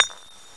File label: glass bottle impact hard; cstrike